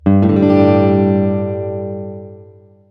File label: g sus2, guitar chord